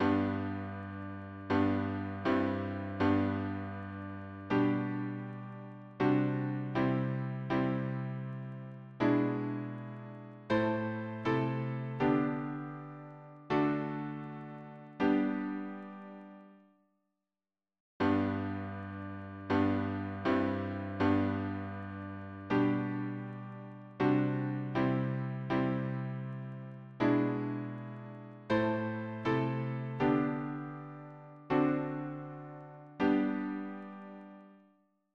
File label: harmony, piano